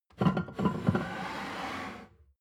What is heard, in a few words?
plate stack slide table, gastronomie; berufe; soundpool